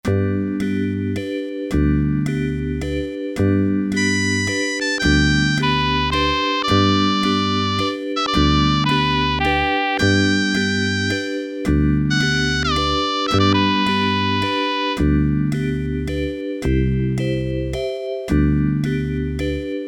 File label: LULLABY, Yamaha SHS-10 Factory style demos